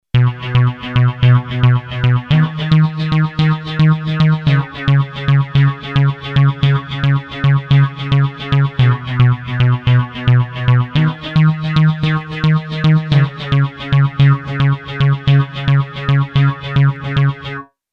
bass reverse, synth

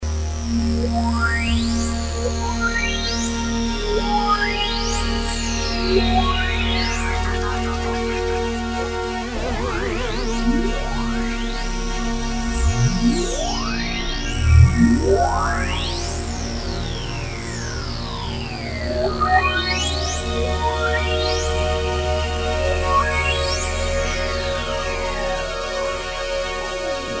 Korg DSS-1

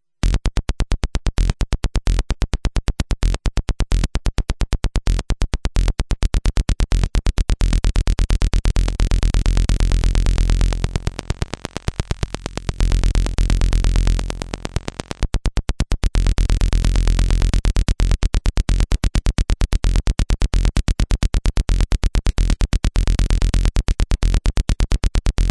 bass line, yamaha tx81z

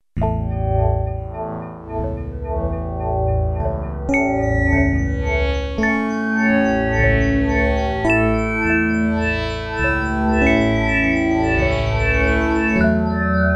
synth wavestation, yamaha sy99

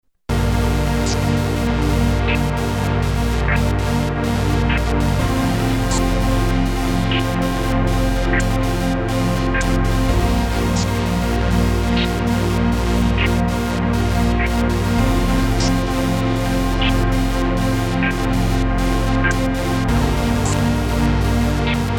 Pad filtered; Red Sound Darkstar

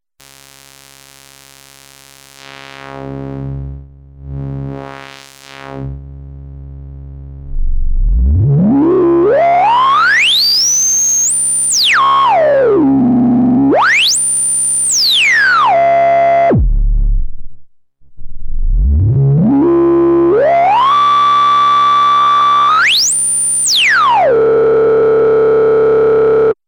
bandpass, nanozwerg; MFB nanozwerg

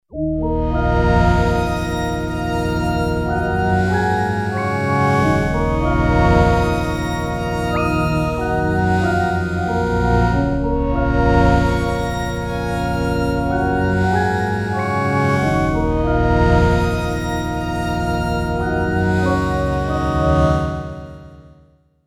synth soft, yamaha sy99

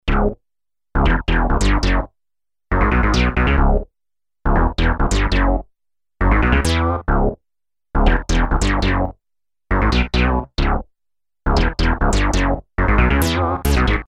bass attack, synth roland jd800

